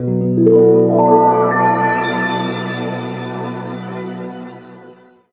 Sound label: B P-S WA2-L, Guitar; East West; Steve Stevens Guitar Samples Collection; PTCH-SHFT+WA